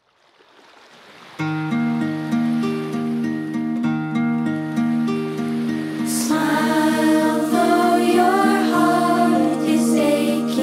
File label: Children s Choir